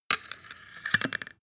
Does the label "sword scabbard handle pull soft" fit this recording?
Yes